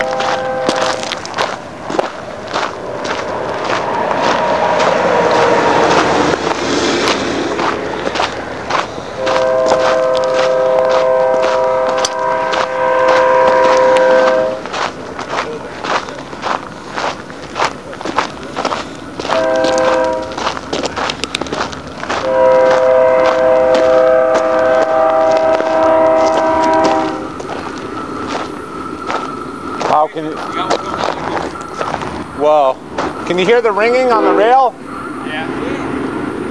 Field Recording (Freight Train)

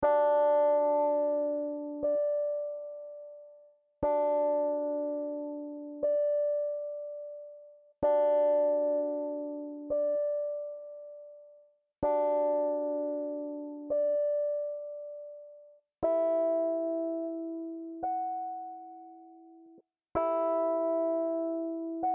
Retro Synth Chords

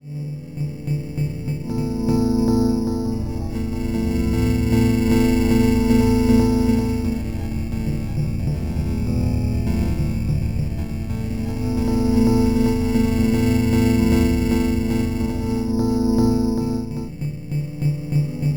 E90 Chord Noises

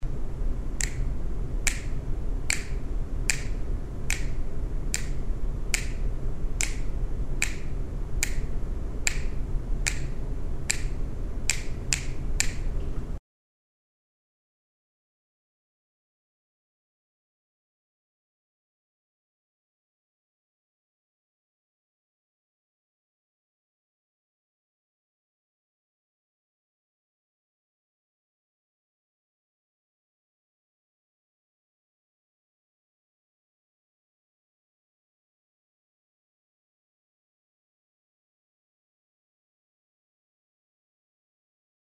finger snaps

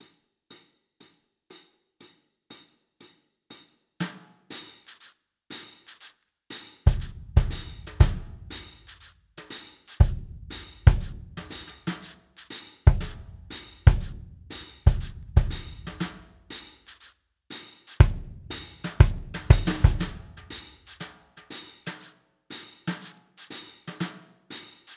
Drums

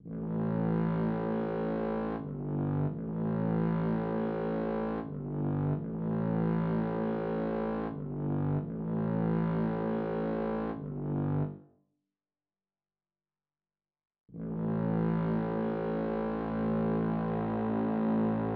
French Horns

